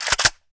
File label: ak47 gold bolt up